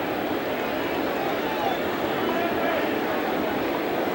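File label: baseball crowd ambience loop; SFX Kit; Crowds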